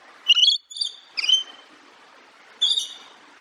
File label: raimbow lorikeet call